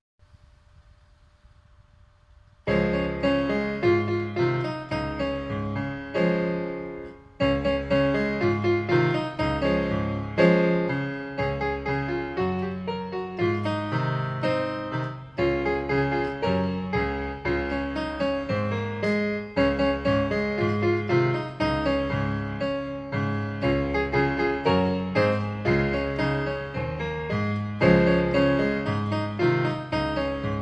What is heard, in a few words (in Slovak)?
klavír (Des-dur)